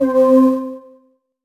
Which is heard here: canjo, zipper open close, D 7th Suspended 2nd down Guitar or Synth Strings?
Synth Strings